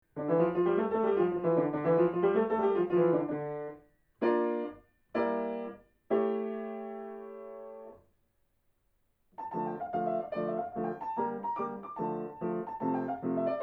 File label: Tarantella